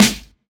crispy snare g sharp key; snare drum samples

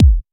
studio kick single hit a sharp key

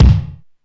small reverb kick drum sample c sharp key

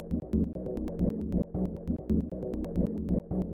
techno synth arp fluid; synth loops

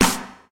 fresh clicky snare sample f key; snare drum samples

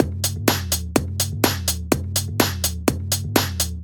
snare and synth techno loop; drum loops; future novelty

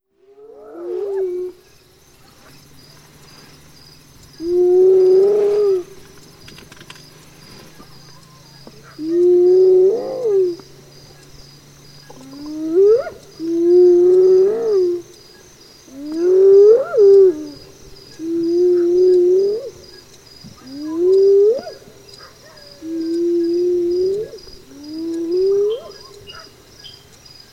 hyenas; animals

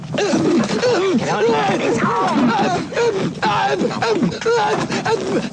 AGONIZED GRUNTS

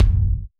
short reverb kick drum one shot f key; kick drum samples

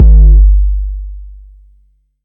ambient bass drum sample g key; kick drum samples

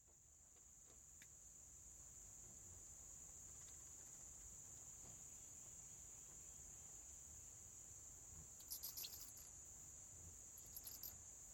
bats wing flaps in cave habitat

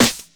sparkle snare drum a sharp key

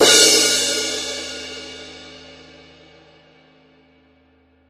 huge drum crash sample A Key; cymbal drum samples

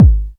good kick drum sample g sharp key

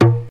mid tom drum single hit a sharp key

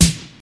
classic dry acoustic snare sound e key; snare drum samples

